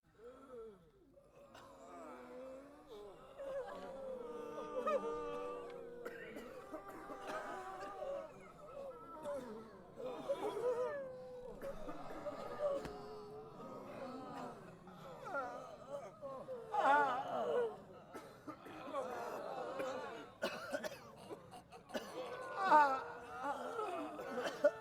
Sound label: battle agony moans, crowds